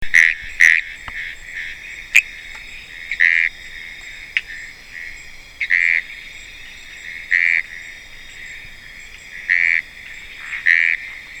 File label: Kurixalus appendiculatus